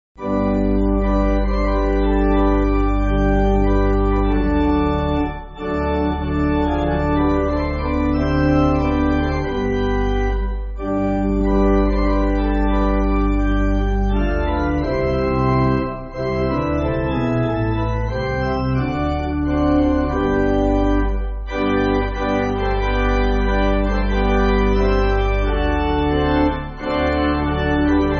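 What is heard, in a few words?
Pipe Organ, Backing Files for Churches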